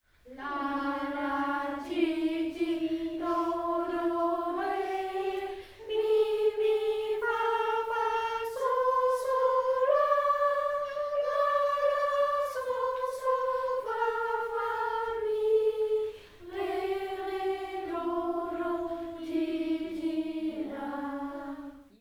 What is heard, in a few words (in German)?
Kinder Moll